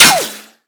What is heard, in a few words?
ricochet; STALKER; IWP